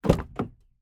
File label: car door open